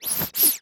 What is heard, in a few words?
Foley Toy Squeak Airy